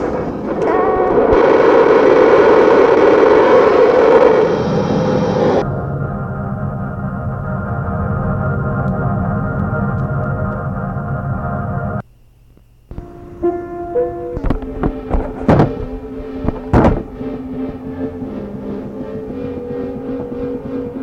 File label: ambi train